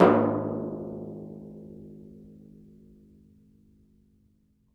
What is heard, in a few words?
TMP A# HAN, World & Orchestral Percussion; ILIO; Synclavier Percussion 2